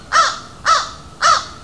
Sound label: crow; natureNavigator